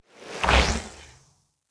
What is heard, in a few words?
Vulcan pig Blast